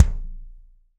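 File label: BD DRY, bass drum; Best Service; Real Mega Drums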